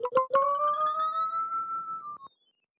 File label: Musicbox; viewfinder; Special-Photo Impossible-Triangle